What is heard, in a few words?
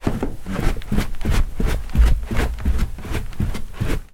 foley footsteps stairs down carpeted bare feet